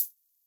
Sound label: HH, HIHAT